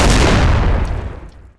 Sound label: basilisk explode, weapons; Dystopia